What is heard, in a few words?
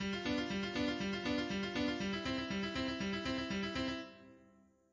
aug Alberti bass